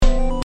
coin effect; Mario1-1

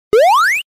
teleport; Mario1-1

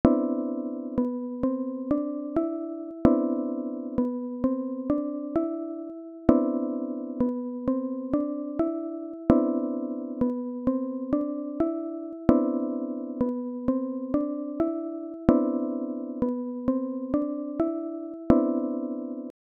Game Over Music; Guardian of Silverkeep

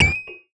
Bee Hit; Platformer

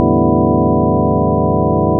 Guitar All Strings

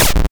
8bit pop; balloon-man

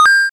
Pickup Coin; Final Platformer

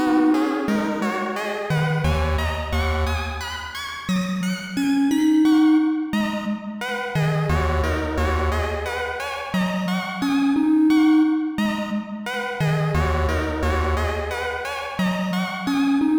Outside; Platformer

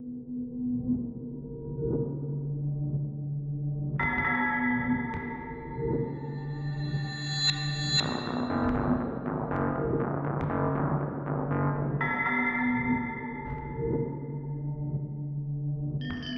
coffee shop; Dream Bean Game Songs

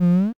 balloon jump; balloon-man

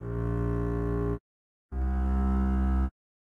lead in; balloon-man; level 3

